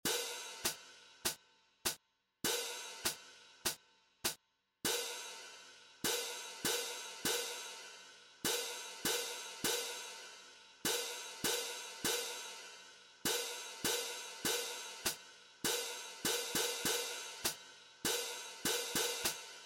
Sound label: cymbals, drumline